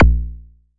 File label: blip, Kicks; single hits